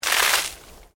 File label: Water Splash Concrete Medium; Elemental weather effects; Water Sound Effects; Nature